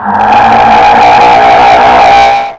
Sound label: Doom Siren